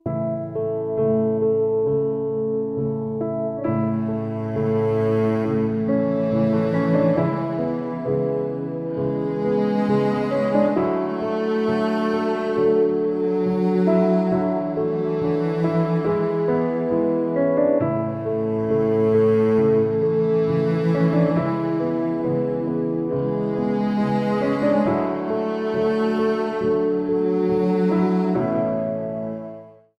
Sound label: Peaceful River